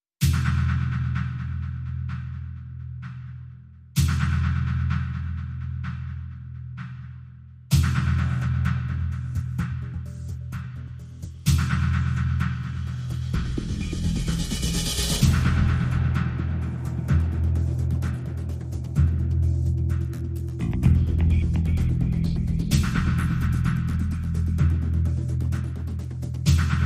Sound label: Perc and Pulses